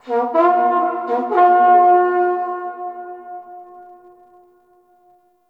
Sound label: battle trumpet horn call; Warframe; Tau